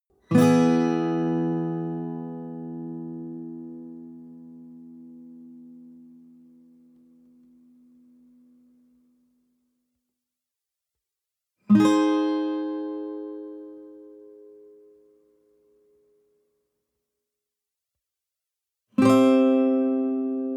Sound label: western guitar chord high major